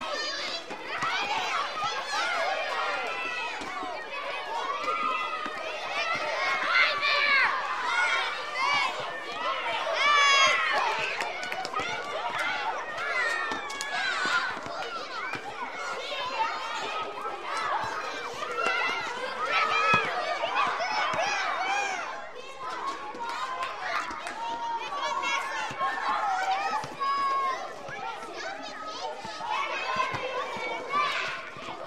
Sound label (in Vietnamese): Tieng tre em choi dua